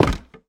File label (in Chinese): 盔甲架破坏声